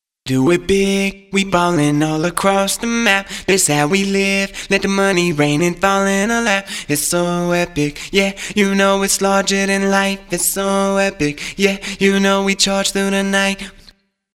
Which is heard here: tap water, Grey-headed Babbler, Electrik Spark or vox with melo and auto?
vox with melo and auto